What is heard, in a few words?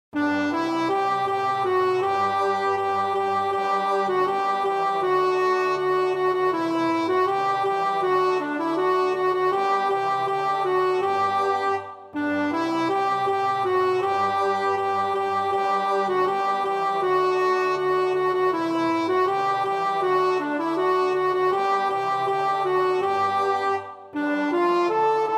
now is the month of maying, alto; morley